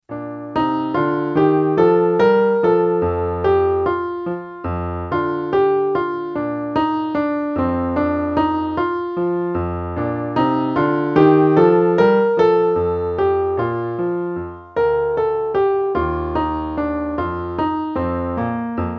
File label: Dotted Half Notes